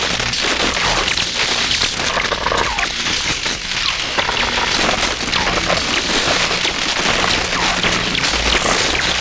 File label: lightning idle, weapons